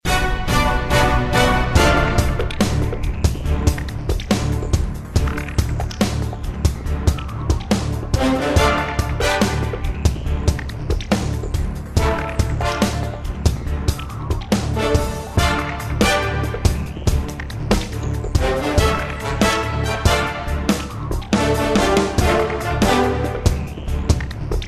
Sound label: bg battle; Witch Spring; Android